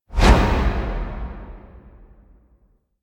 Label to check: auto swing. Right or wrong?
Right